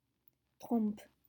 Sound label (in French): trompes